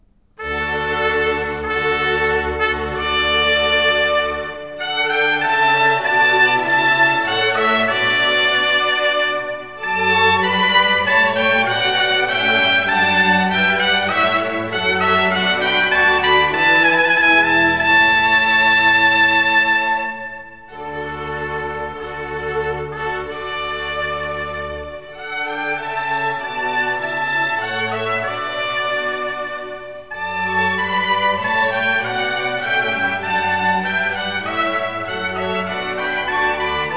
Water Piece, suite for trumpet, strings & continuo in D major; spurious arrangement; Trumpet Concertos